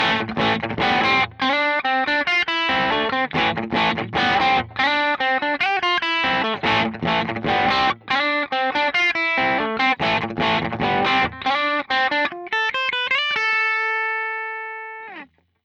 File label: crunch FX; interface test; iD14MK2